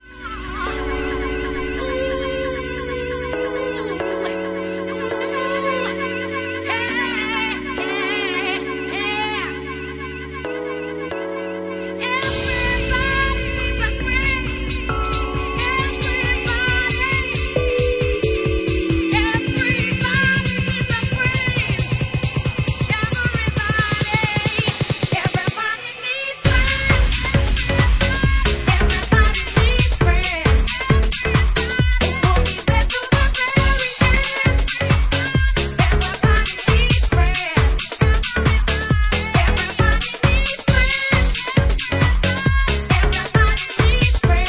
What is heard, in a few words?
Organ Mix